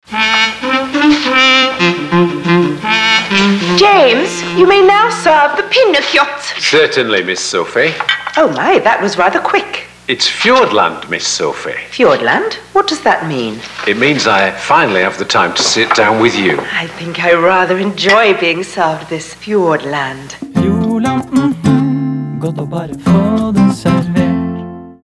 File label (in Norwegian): Radio Trompet i start ny lydlogo; Radio PINNEKJOTT; jordland